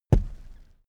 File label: step wood step